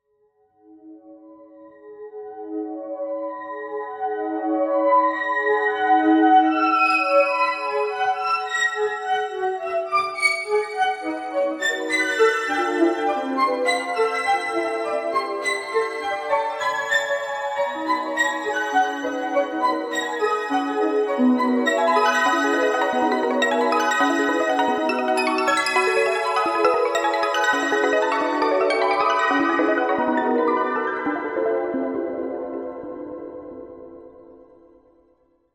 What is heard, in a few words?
a short MIDI loop produced in my friend River’s Clavier 36, altered a bit in Jackdaw and “orchestrated” with some automation tricks